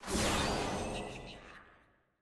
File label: fanfair appear; shaco